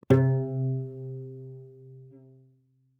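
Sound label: Cello pizz fortissimo sul G C3; MIS Pitches - 2014; Strings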